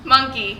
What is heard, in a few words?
monkey